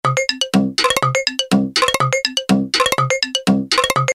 PCM Loop; Waveform demos

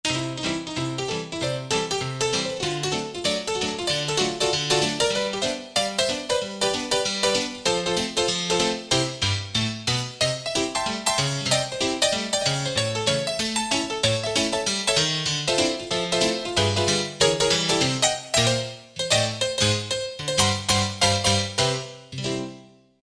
Tack Piano; Roland SRX-05 Supreme Dance

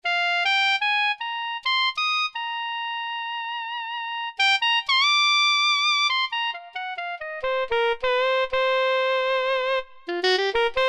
Sopr, Yamaha TG500

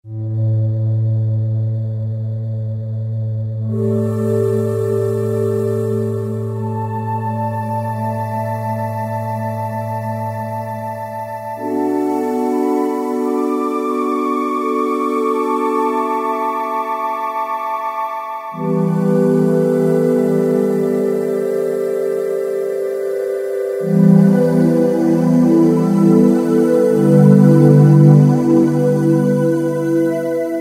Xenon Pad, Yamaha YPT-400; Voices

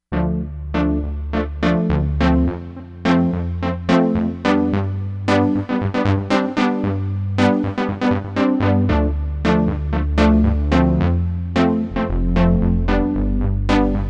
Bright Synth

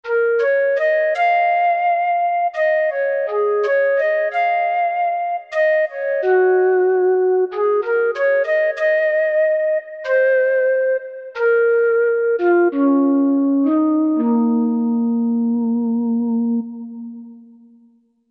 Flute Too, Korg MSC-11S Brass

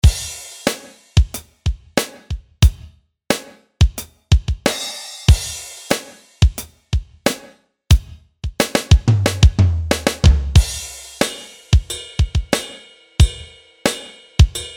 Room Small; IK Multimedia; SampleTank Free

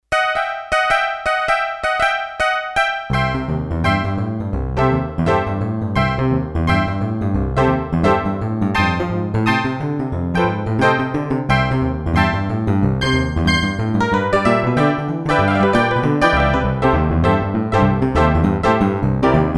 Honky-Tonk Piano, Roland D-10; Factory Patches

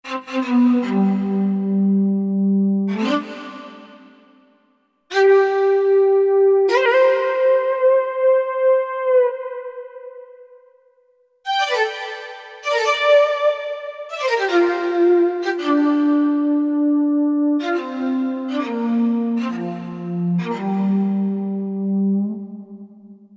Shaku Wave, Korg MSC-10S Ethnic

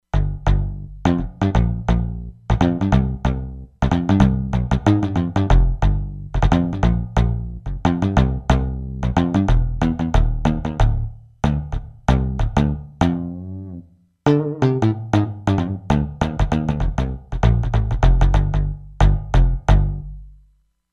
Hoofed Bass, Korg MSC-16S; Korg MSC-16S Environment

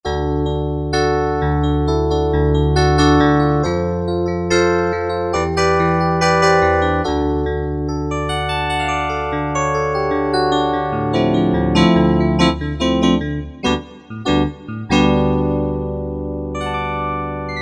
TX Full Tines; Sonic Reality Modern Songwriter

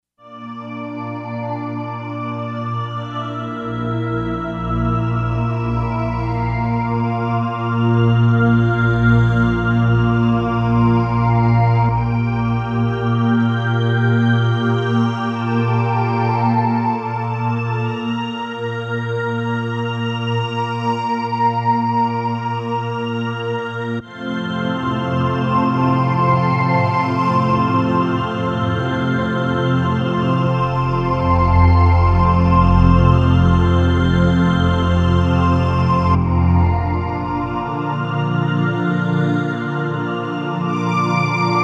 Phase Strings; Roland SC-880; Factory Patches

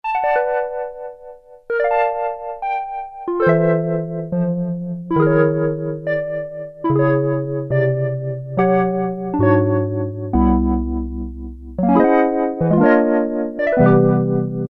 Vibraphone, Roland JX-3P

